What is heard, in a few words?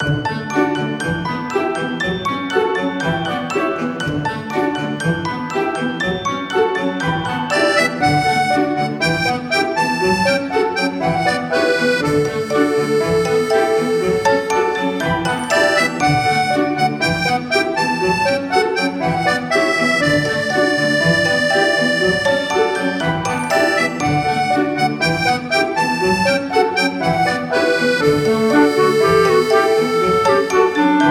Kittens Caprice loop, BGM